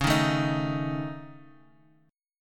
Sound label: Db Suspended 2nd Sharp 5th Db Guitar Half Step Down; Dbsus2#5